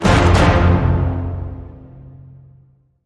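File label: Hit Orchestra Tutti Double F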